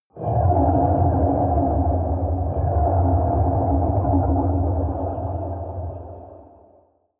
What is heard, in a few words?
underwater rumble